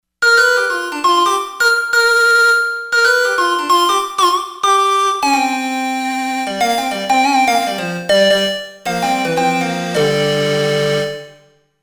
ethno lead, Korg; Korg MSC-13S Ethnic 2

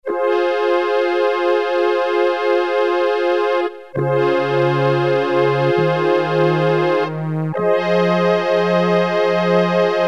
BRASS ENS, Casio CZ-1000; Preset

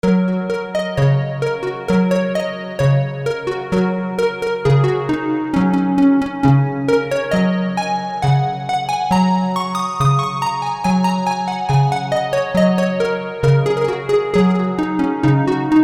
Roland U-220; Factory Patches; Pomona

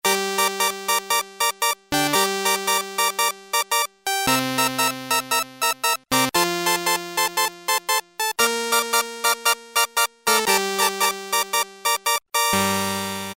Harpsichord, Roland Juno-106; Factory Preset

